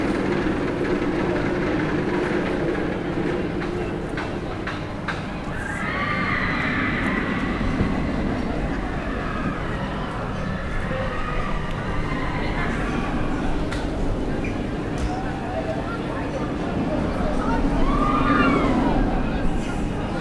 hullamvasut kozelrol

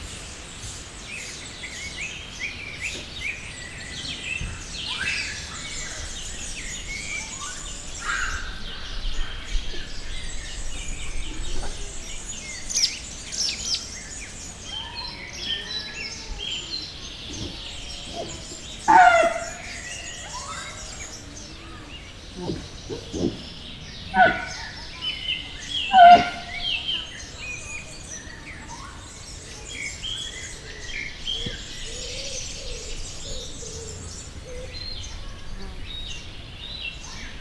balra távol makákó kétpúpú teve; jaszberenyi zoo 2015

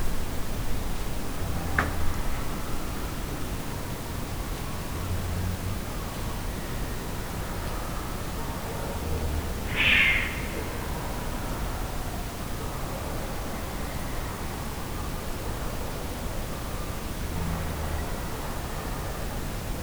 ejjel, nem en alszom oda bent

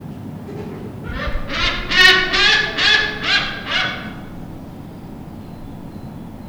egy szólam, trombitás szarvascsőrű; debreceni zoo 2012; marantz puska

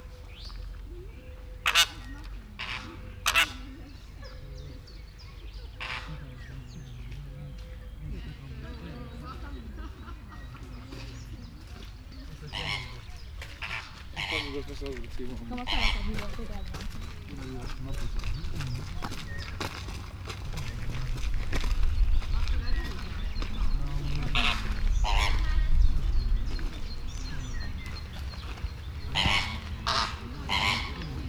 a vége felé a lábával vizet fröcsköl, rózsás flamingó chilei flamingó; allathangok; veszpremizoo 2013

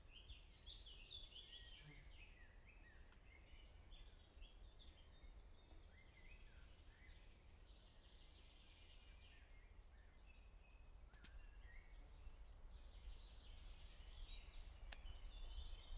bzmot, vonat kivulrol; belavar felol; vizhaz; cardioid

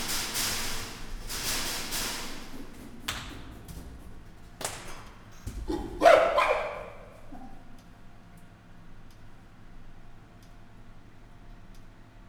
zörög a kerítés megszólaló majom, galléros pávián; pecsi zoo 2017

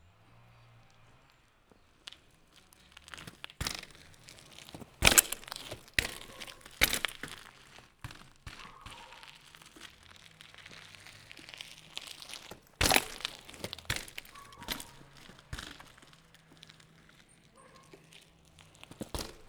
menet kozben ugratas, kerekpar tura; varboc

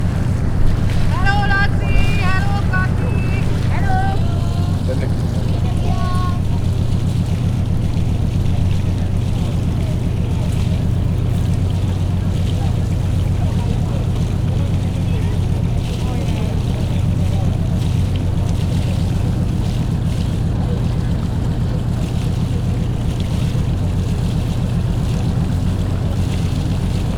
útitársakkal kiinduló hajó

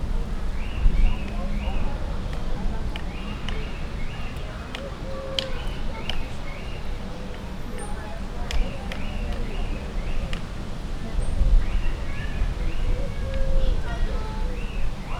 fát eszköznek használó majom, apellacsuklyásmajom; gyori zoo 2014

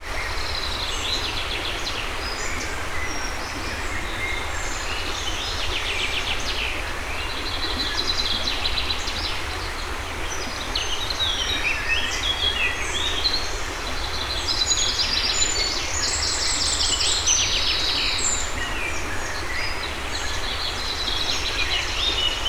tavaszi hajnal patak, erdő; termeszetben

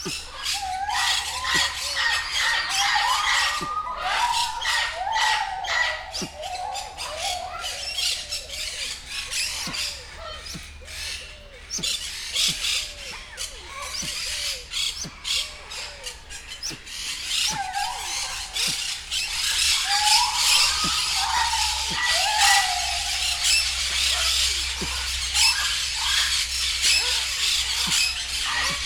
mozgásban levő nyög de csel ő hang, sisakos hokkó; nyiregyhazi zoo 2019

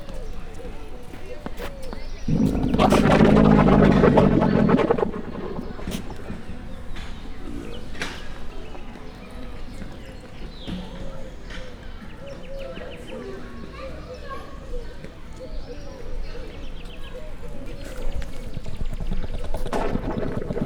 kérődző nőstény, dromedár; gyori zoo 2014

